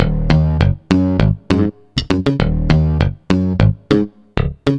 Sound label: bass (plucky); Sonic Foundry (Sony Creative Software); Ugly Remnants VOL-2